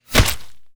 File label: bullet impact body flesh